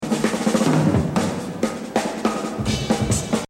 Exercise, jazz drumming

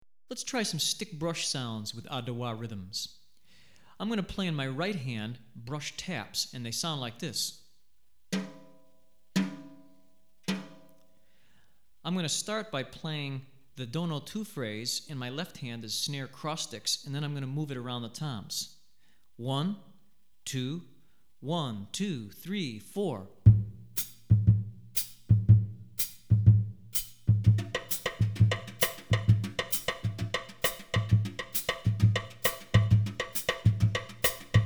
Stick Brush, West African Rhythms For Drumset; Adowa; Hartigan

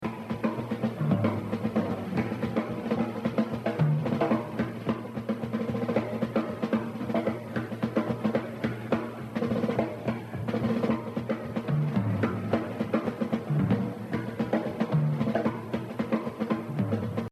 Jazz Drumming

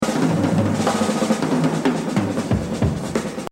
Exercise, jazz drumming; Exercise 25 Buddy Rich - 100; The Evolution of Jazz Drumming (Gottlieb)

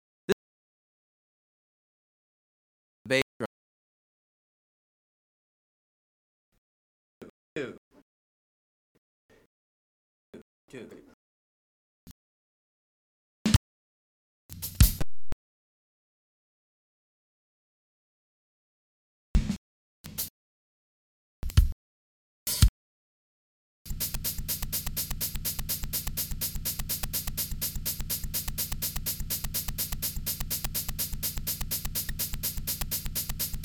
Afro-Cuban Grooves For Bass And Drums

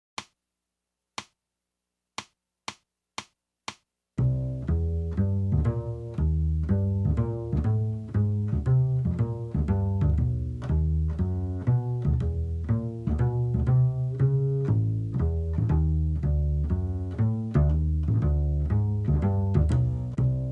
64 bars Bass at 120; The Art Of Bop Drumming; Riley

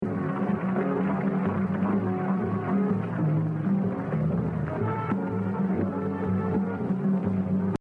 Exercise 7, Jazz Drumming - 64%; Gene Krupa; The Evolution of Jazz Drumming (Gottlieb)

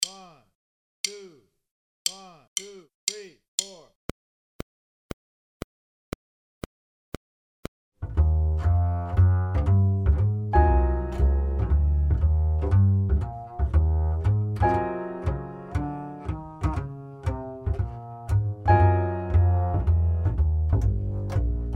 Swing, Drum Fills; Essential Drum Fills; Erskine

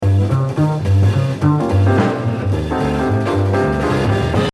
Exercise, jazz drumming; Kenny Clarke; The Evolution of Jazz Drumming (Gottlieb)

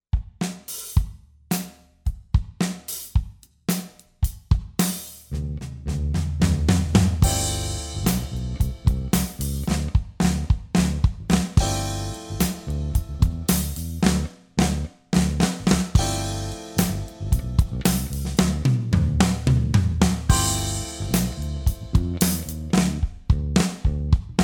Pop, Drum Fills; Essential Drum Fills; Erskine

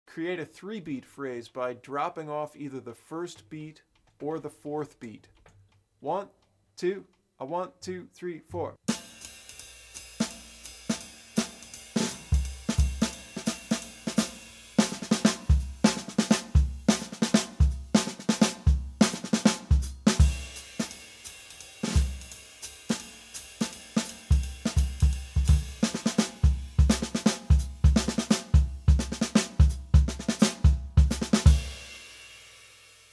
Three beat phrases, Bop Drumming; The Art Of Bop Drumming; Riley

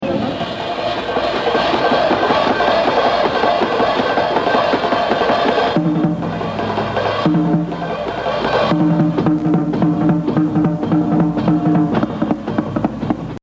Hi-Hat Sol; Etude 6A; Jo Jones Hi-Hat; The Evolution of Jazz Drumming (Gottlieb)

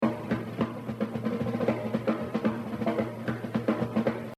Jazz Drumming; The Evolution of Jazz Drumming (Gottlieb)

